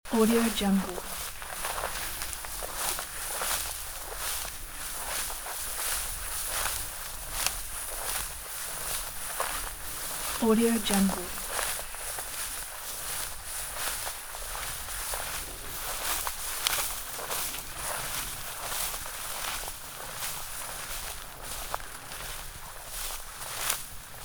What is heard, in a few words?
footsteps in tall grass loop